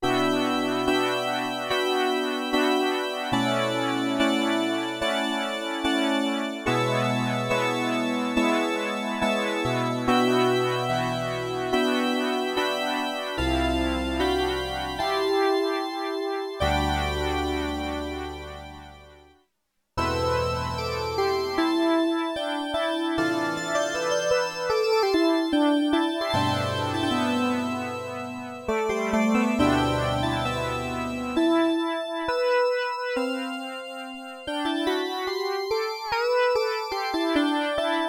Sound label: SYNTH; Factory